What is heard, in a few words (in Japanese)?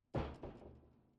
炭酸シャンプー SE